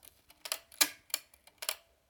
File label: Answering Machine Turnover